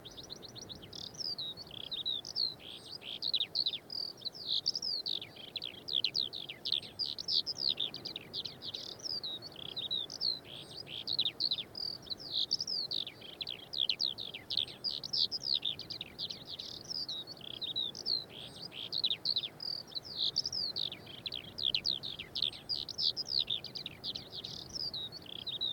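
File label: Berkshire morning; The 39 Steps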